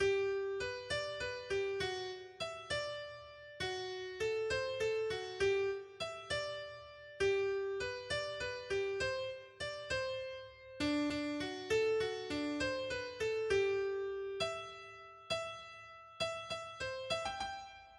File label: Mexican Waltz